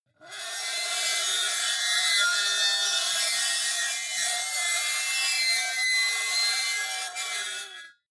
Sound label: metal lid screech